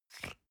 toothpaste screw cup open medium single

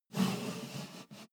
step barefoot metal slide long

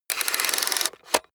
plastic mechanical draw rattle lock

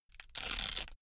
velcro large open

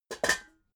pots hit

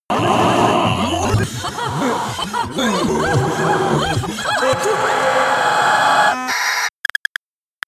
vocal glitch

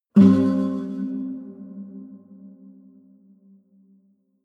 low string buzz dissonant

